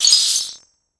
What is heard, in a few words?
Btl Actlrng Gravel; The Denpa Men; Android